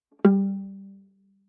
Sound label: Viola pizz ff sul C G3; MIS Pitches - 2014; Strings